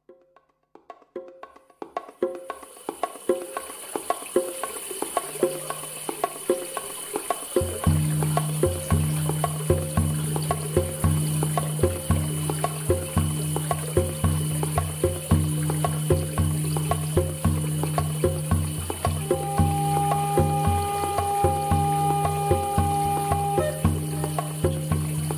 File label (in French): musique tambour chamanique